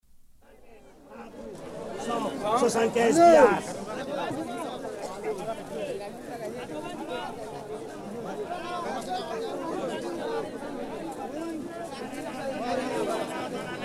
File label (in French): bazar